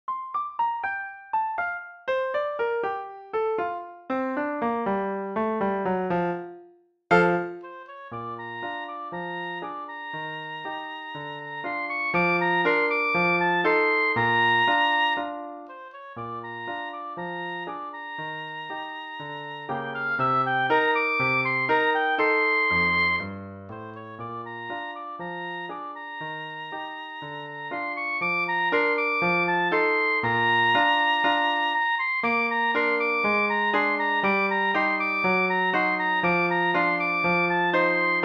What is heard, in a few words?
the entertainer oboe